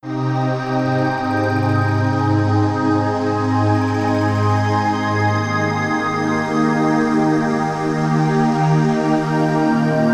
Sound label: meditative music